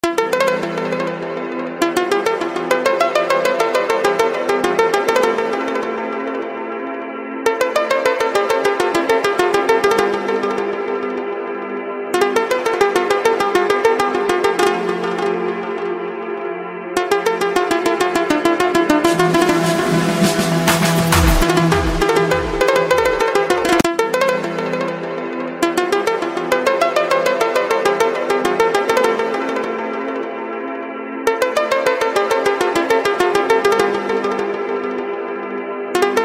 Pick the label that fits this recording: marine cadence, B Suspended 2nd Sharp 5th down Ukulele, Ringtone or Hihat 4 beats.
Ringtone